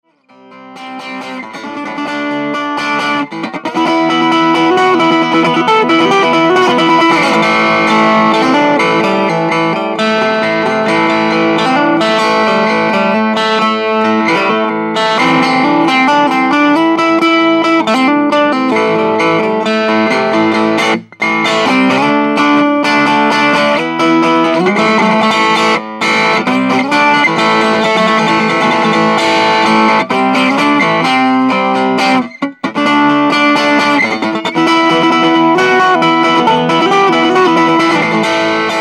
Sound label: Baritone plugged